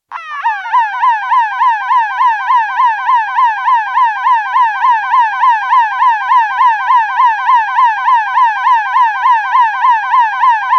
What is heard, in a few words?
Ambulance yelp siren, exterior acoustic; Emergency Vehicle; Used by St John Ambulance Service; BBC